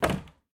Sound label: Wardrobe Put; Game rip; MiSide